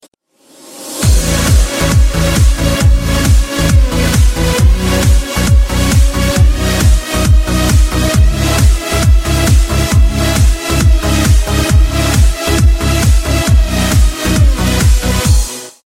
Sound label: Trance; Armada